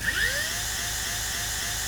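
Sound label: gun minigun start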